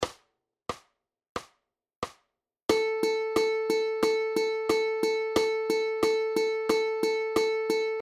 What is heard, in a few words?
8th notes